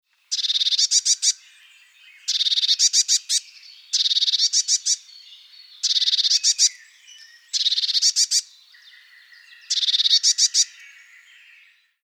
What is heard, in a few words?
Parus caeruleus